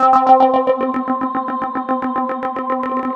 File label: C5, SynthLine; 90 s Sample CDs; NiceBeats; SynthLine VOL-5